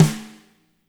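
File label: fat snare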